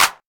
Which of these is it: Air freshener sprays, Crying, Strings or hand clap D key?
hand clap D key